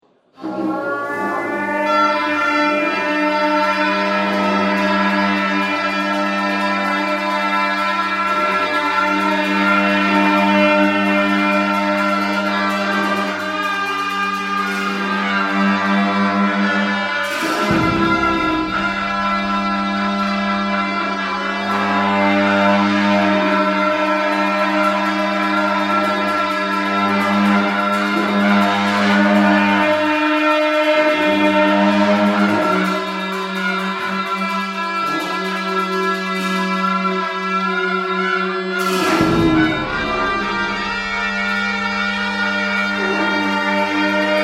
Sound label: mahakala puja